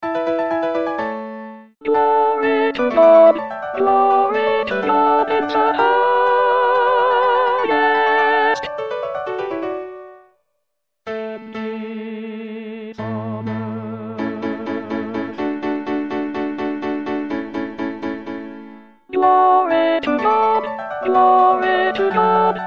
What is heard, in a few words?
Alto; Handel; Messiah; Glory to God